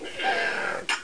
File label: Ant Attack; Curse of Dragor Demo